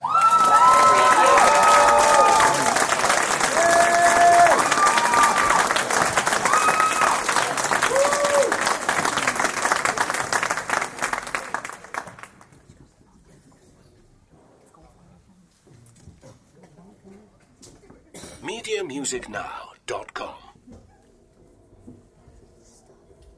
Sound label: Audience Applause; beanstalk audio